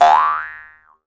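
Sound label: Jaw Harps, Pitched Percussion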